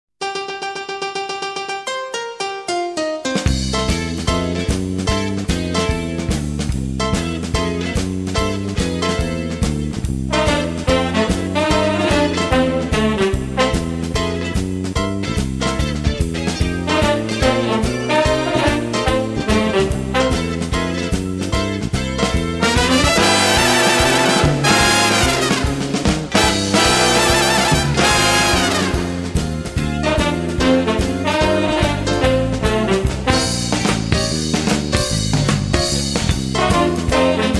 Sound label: Boogie Woogie